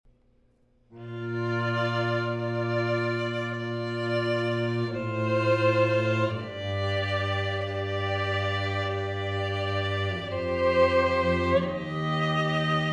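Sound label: duo violin cello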